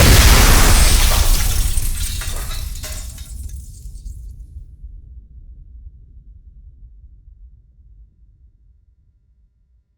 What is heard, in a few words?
Explosion hit glass; Hovl Studio; SFX Battle sounds; WhiteMan Unity2D